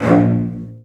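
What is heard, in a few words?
CELLOS C4, Strings; ILIO; Synclavier Strings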